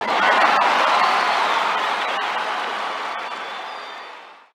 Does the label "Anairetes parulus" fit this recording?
No